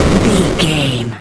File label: retro explosion general